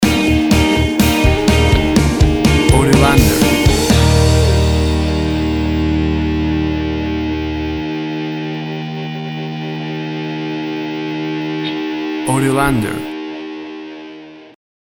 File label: The Winning Song Stinger, An uptemp; An uptempo and upbeat piece of positive indie pop rock with a m